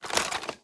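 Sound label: equip movement; Valorant; operator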